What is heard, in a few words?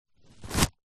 household tissue pull remove from box